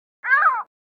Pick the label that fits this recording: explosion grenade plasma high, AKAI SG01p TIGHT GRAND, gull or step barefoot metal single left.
gull